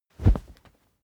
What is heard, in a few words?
whoosh cloth low soft